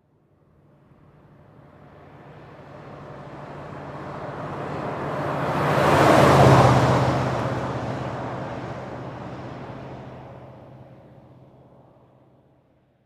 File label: Expedition 30 Mph By, ford expedition